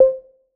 Announce In Between; Apple iOS 16

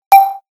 Video Record, ui

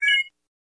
beep-beep, phone notifications; Goophone Y5; iPhone 4S clone; Chinese clones

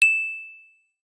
Twinkle, phonetones notifications; Optimus F3 MS659

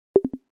arc To Particles; Apple; iOS-18

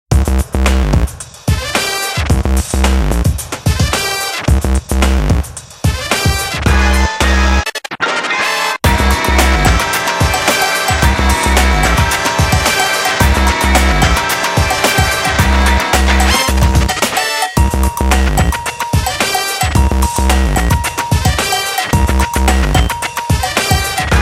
Scratchy, Nokia Tones; Windows Phone apps

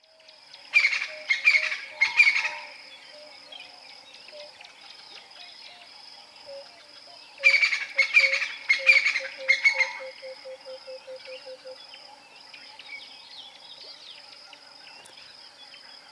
Limpopo riverbed at dawn; Google; Google Sounds; Sound Matters; alarms

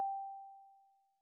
First ear complete

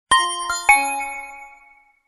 alert night; HTC Touch Diamond

